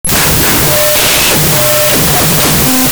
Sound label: Cash Register; 1001 Sound Effects; Foley